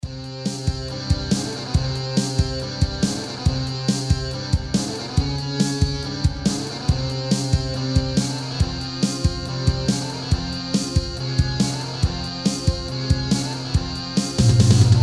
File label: guitar whipper